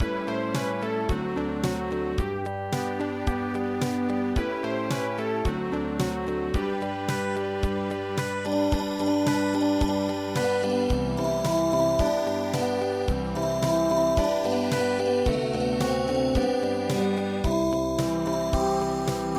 Hula Ballade

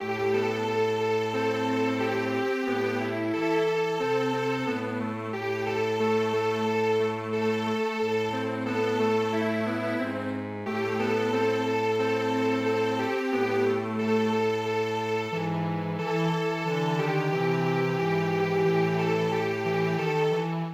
Strings Quartet

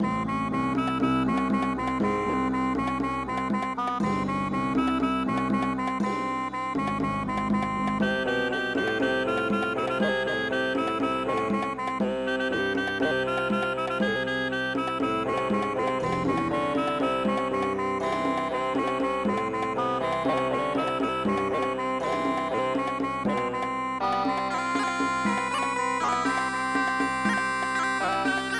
Indian Traditional; Pakistani Spin Song

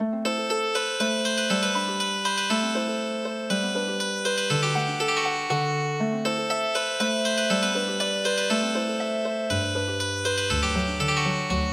wide sky Healing Harp

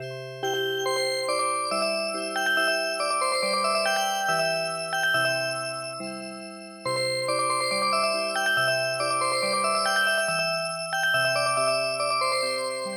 Weeding Song Music Box; midi; traditional

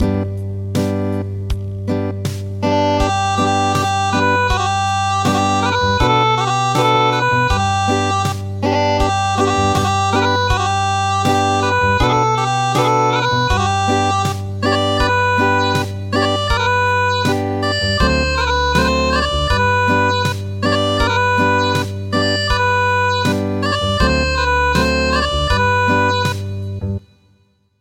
Acoustic

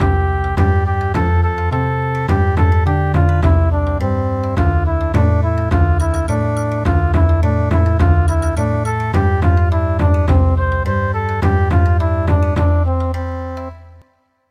Bon Odori, midi; Row row to the fishing spot

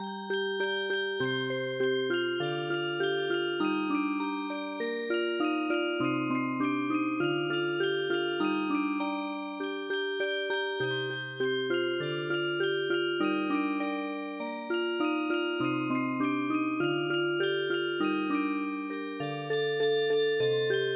Music Box, traditional

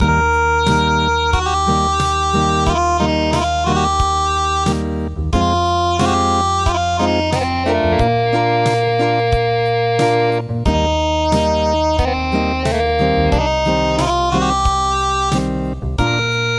Acoustic

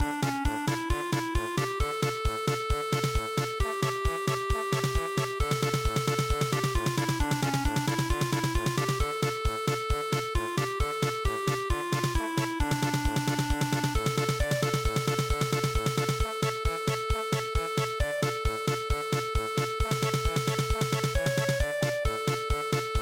polka

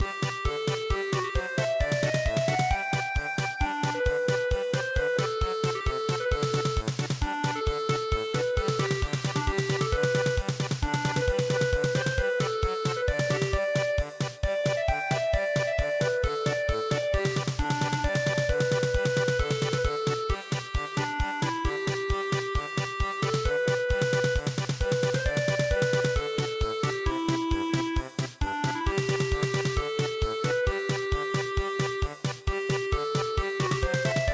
polka

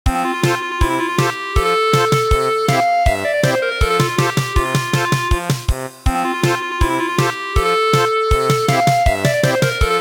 German Polka, midi traditional

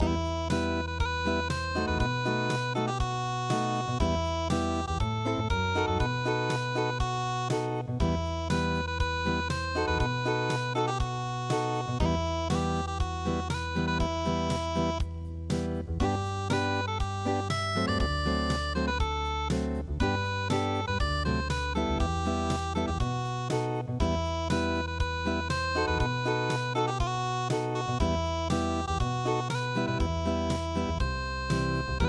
unpluged, midi; tsuioku

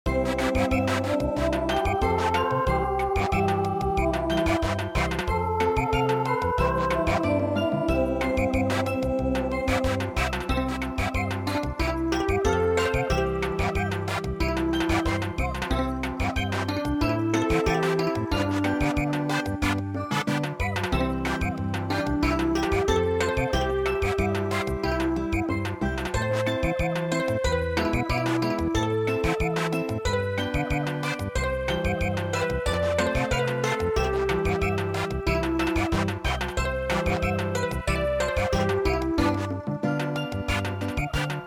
Samba

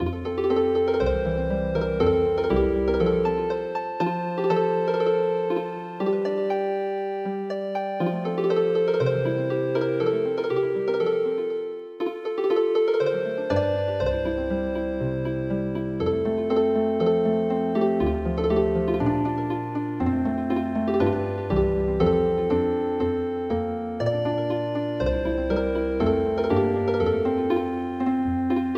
Healing Harp; Carp Streamer

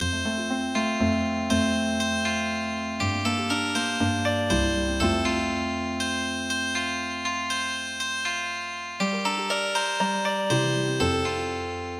vienna waltz Healing Harp; midi; traditional

